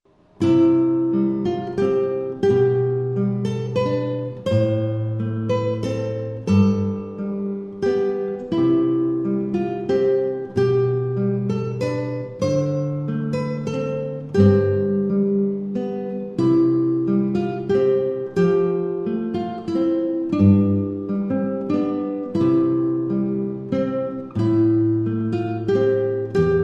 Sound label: student duet, classical guitar